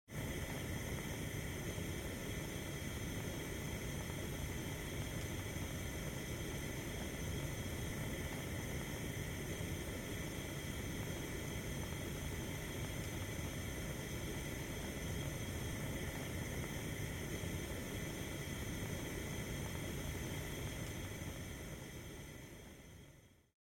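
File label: sounds of hookah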